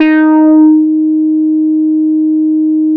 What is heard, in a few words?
FUZZ D#1; Strictly Synthe1; E-MU Producer Series Vol. 6 – Analogia Project